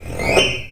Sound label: HIHARPGL, The Piano; FX; InVision Interactive